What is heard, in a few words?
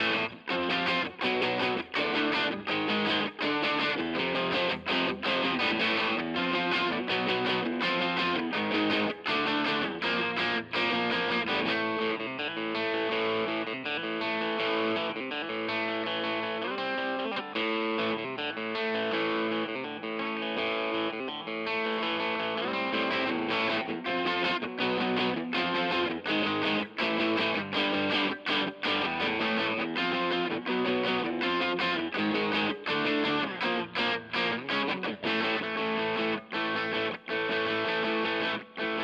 DC 60 Junior Crunch; Harley Benton